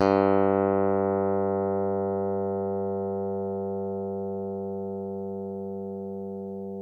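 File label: Yamaha open, Bass; G2; Masterbits CLIMAX 7; PATITUCCI BS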